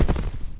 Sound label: Caiman Junior run